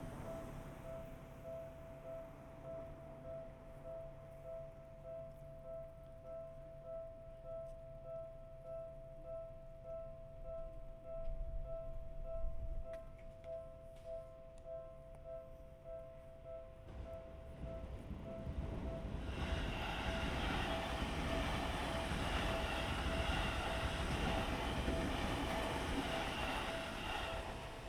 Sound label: Binaural; RODE NT-SF1; Field recrding walk